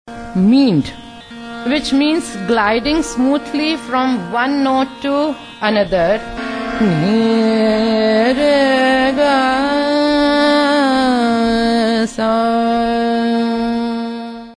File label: meend